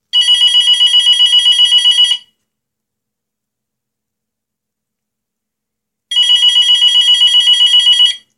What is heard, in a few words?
Mobile hEar loud; Opis-60